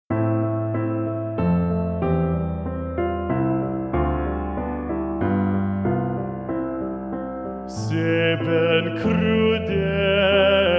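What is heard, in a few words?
sebben crudele, a minor, chorus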